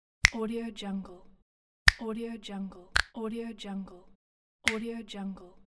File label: finger snap